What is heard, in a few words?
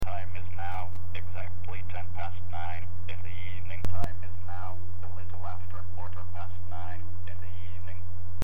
clock; tt clock